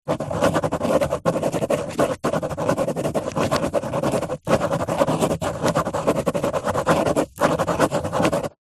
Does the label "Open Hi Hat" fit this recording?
No